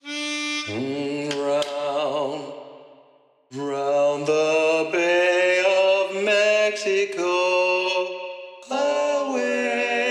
Round the Bay of Mexico (Lead Predominant), sea shanties